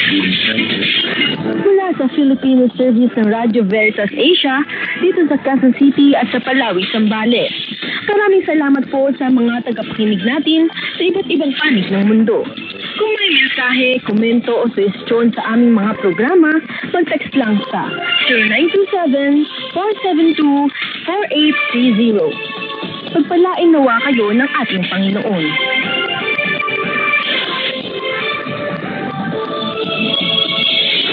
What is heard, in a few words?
Radio Veritas Asia Philippines 15355 kHz Sign Off